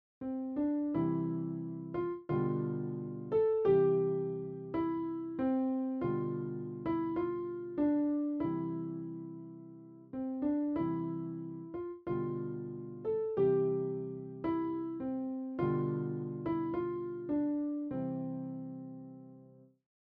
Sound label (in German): Die Spieluhr